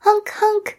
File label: Honk honk; Voice Loot Filter and Voice Lines